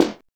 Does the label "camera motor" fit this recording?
No